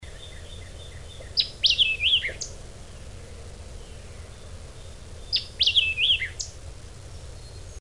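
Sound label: Warbling Vireo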